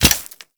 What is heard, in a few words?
Ghoul Expired Grenade Hit Dirt; Warframe; Grineer Ghouls